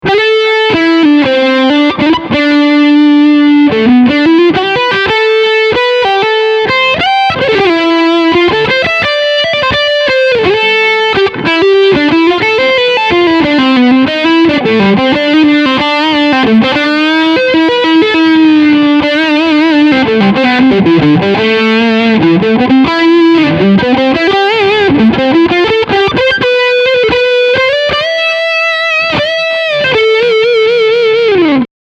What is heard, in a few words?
Position V Overdrive